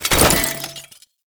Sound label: Cannister Throw Prep; Enemies; Abilities; Warframe; Tau; Dax Archer